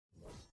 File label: static; mine sweeper; epoch times